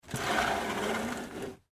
Hanger Slide Metal, Hanger Slide Metal Single Clothes Closet; Household; Blastwave